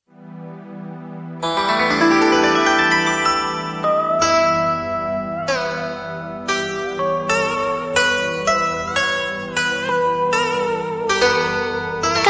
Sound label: Lk beat, playback